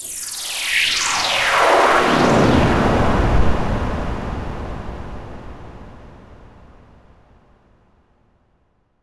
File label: Lazers